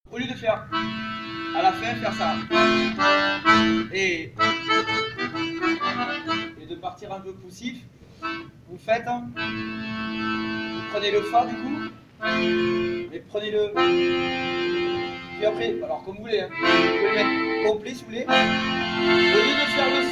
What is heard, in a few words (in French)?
changement de cercles montee; Musique d-ensemble; Cercle circassien